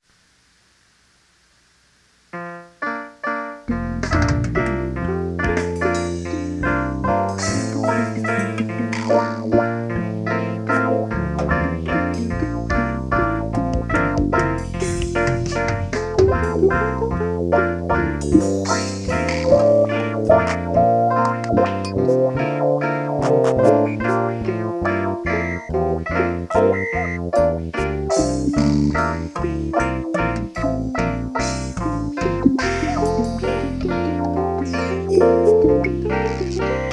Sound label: computer variations voice parametric